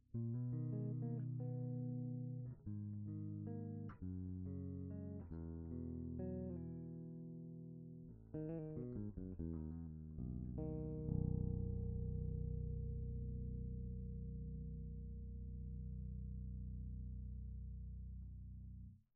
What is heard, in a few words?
finger passive; Kensmith